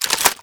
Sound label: shotgun reload